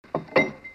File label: Chest Idle